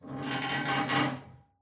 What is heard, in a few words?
wood creaks; Half Life Alyx